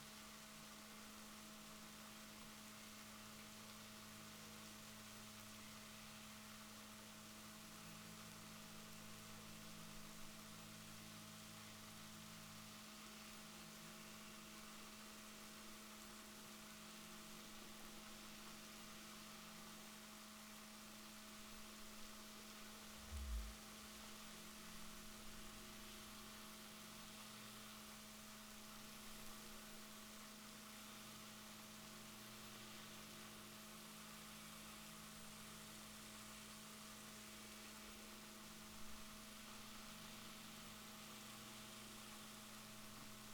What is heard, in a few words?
rorquals positif, Balaenoptera Physalus Chile